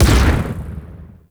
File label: Swarm Missile Explosion; DE; Warframe; Weapons; Sentinel; Helstrum